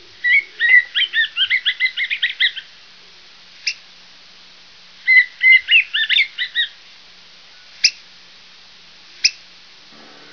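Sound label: black headed grosbeak; bird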